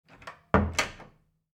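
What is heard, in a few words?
door closed carefully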